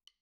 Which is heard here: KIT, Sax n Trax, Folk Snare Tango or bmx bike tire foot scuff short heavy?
KIT